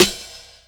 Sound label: snare open east coast